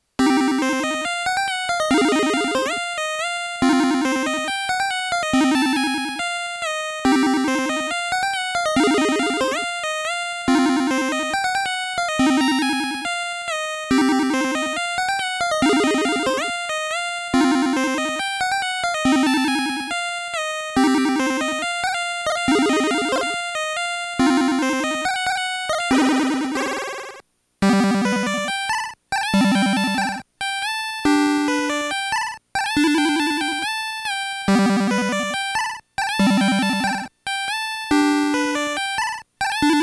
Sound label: untitled gameboy jam; weeklybeats-2020